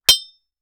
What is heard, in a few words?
Impact Machete Metal; Impacts